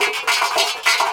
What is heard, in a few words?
Echo Chuff Down Up, Synthetic Pulses